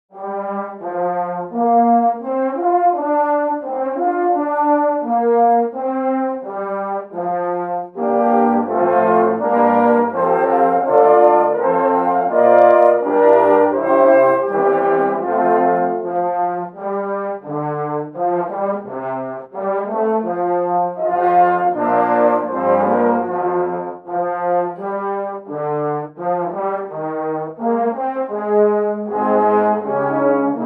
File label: music